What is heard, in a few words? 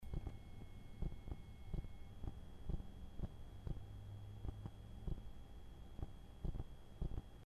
wm 61a microphone noise